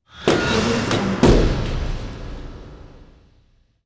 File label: big bang